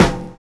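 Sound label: BROKEN SNARE; Univers Sons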